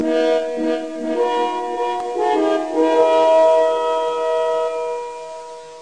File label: Other, Success